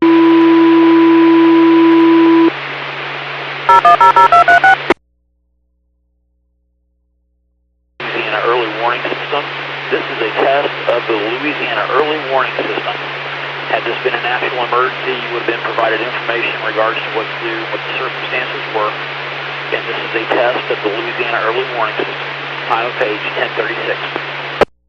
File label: SIREN